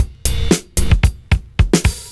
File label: 70s Breakbeats; USB Soundscan vol.46; AKAI